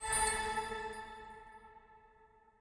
hide Gem; wild bazaar

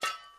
spin Button Down; lost relics

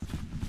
catcher move; fairymirror

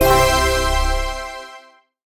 win medium drop; dazzle

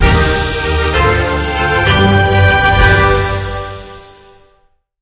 big Win End; lost relics

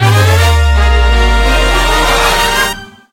near win; disco danny

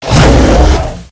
gorilla; silverback

